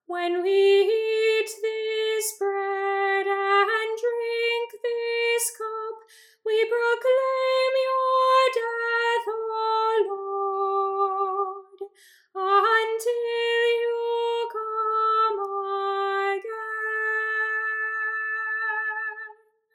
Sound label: Memorial Acclamation B Soprano; Mysterium Fidei